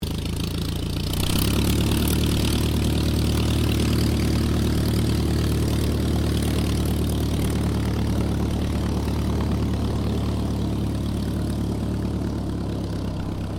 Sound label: AIRCRAFT DH82A DEHAVILAND TIGERMOTH TAXI; RAAF Tigermoth; Airplane Sound Effects; Vehicles